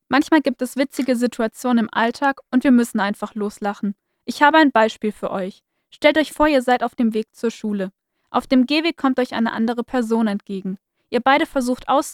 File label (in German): Lachen